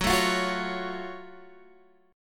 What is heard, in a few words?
Gb Minor Major 7th, Guitar, Standard; strummed; JGuitar